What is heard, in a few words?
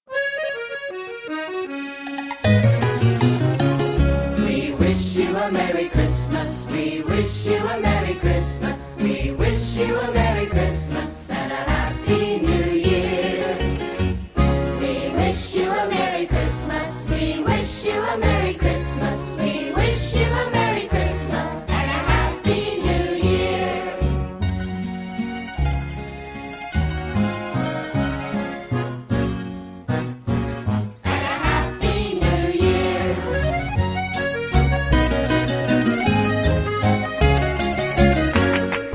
We wish you, no ambience